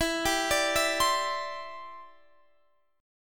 E Minor 7th Sharp 5th, down, Guitar, Half Step Down